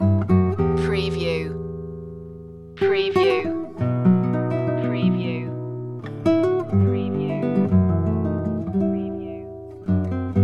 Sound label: bourree loop